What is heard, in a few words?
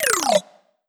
hp down